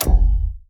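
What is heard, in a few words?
atk shield turn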